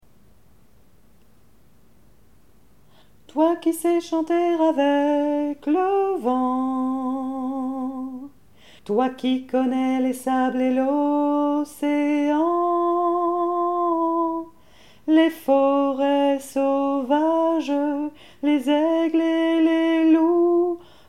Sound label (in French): la terre alto